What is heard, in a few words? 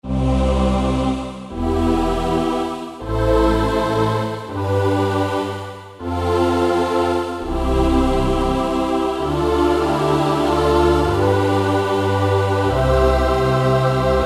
The Singers, Korg N364 Combi; Factory Combi demos